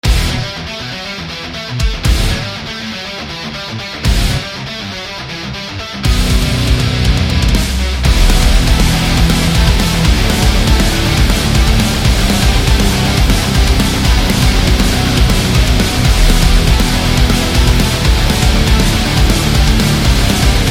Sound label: Heavy and Metal